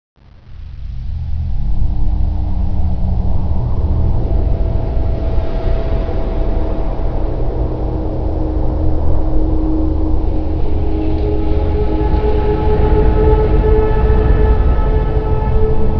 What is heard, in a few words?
eerie; spawngirlsounds